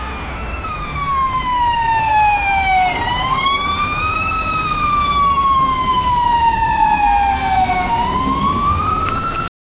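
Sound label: many fire trucks with sirens, approaching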